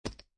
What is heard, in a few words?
Poker Chips Dropping On Playing Cards; Poker, gambling, chip; Card Sound Effects